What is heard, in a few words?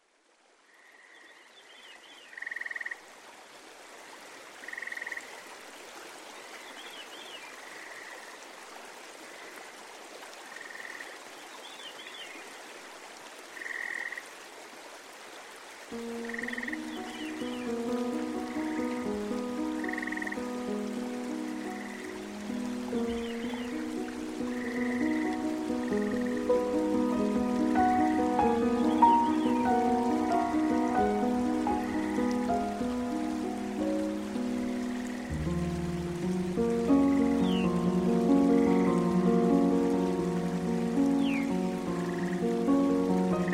Sounds of Nature Forest Piano